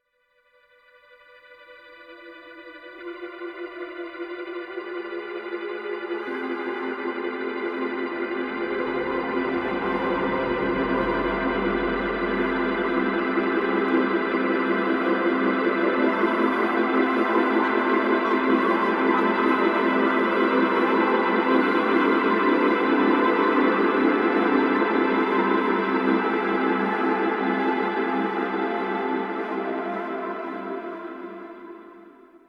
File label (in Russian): Океан (инструментал)